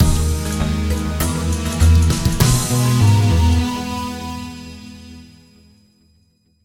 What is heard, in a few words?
Gambit Victory